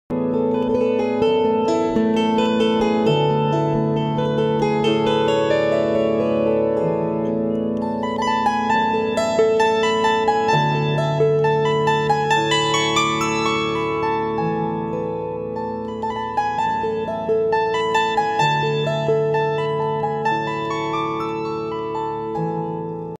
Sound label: Kobza; کوبزا